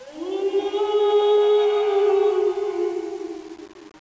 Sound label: boo oooo